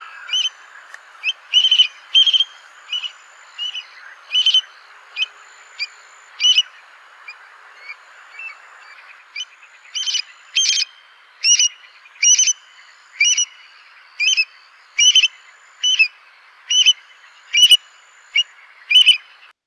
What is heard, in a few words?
call, Stone curlew, adult alarm calls; These adult alarm calls are associated with distraction display which drives the fox away from nest and chicks; Burhinus oedicnemus